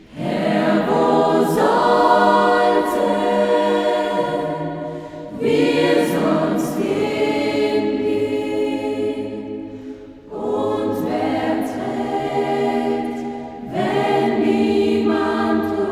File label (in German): Chor Modern